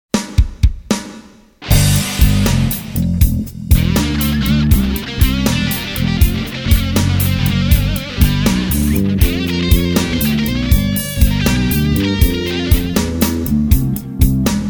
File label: Austin Blues; Soundzabound Volume 4